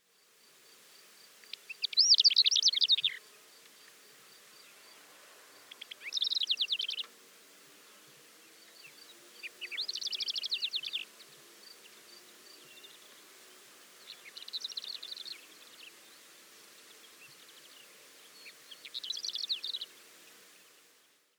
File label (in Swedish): Korttålärka